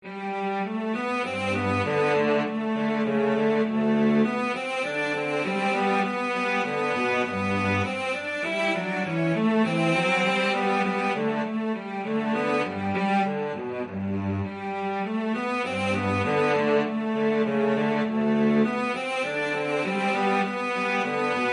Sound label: VLC DUET, cello duet